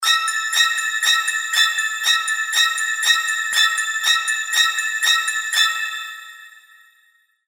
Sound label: Knife; Nightmare